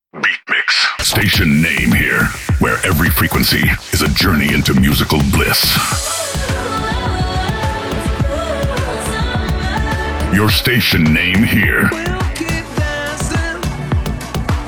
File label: Promo Beatmix 105 BPM